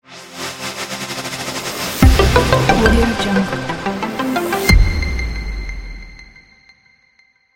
hitech logo

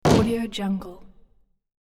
dull gavel impact; hollywoodedge

